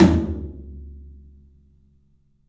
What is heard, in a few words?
3-D Percussives, LOW Toms